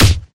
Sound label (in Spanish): pelea